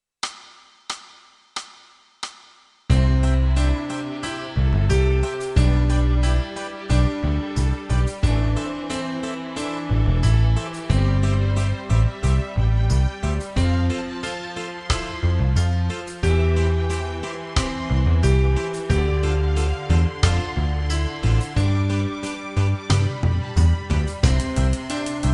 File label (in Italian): Piano tutti